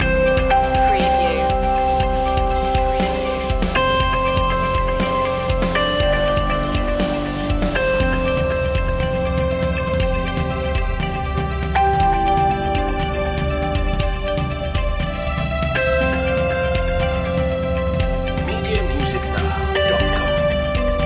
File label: corporate innovations